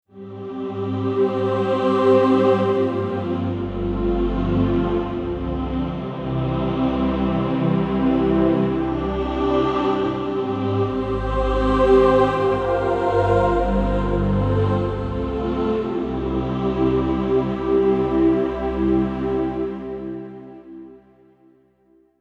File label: Pad and Vox, Synths; MP10